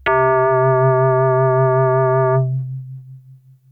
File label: BASS Bellish bass